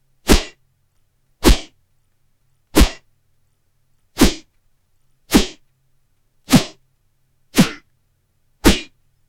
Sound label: epic superhero battle